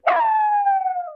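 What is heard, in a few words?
wolf, monster; lang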